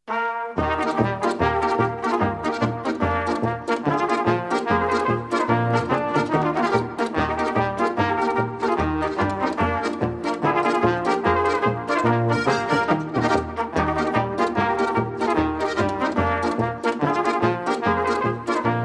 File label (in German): lofererpolka